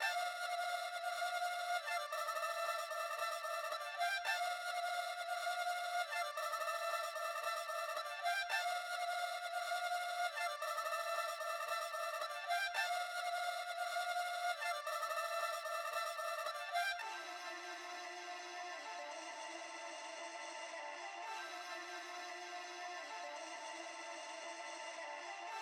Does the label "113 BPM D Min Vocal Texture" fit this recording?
Yes